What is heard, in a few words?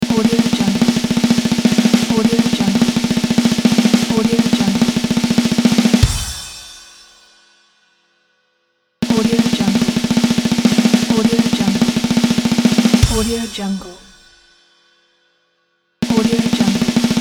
drums roll; amusic7